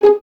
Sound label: VS G#4 Pizz, String Ensembles, Violins; Miroslav Vitous; 11 Violins